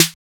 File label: Open Snares